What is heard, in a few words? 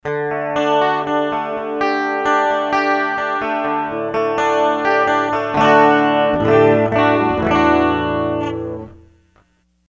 12 Strings; Sony HR-GP5